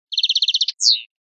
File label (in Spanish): torreada; campero